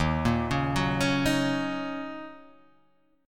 D sharp Minor 7th D sharp, down, Guitar, Half Step Down